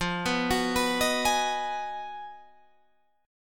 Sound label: B 6th F, guitar chord; B6/F; arpeggiated